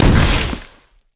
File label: Hit Generic; Pele; pantheon; medusa and pele abilities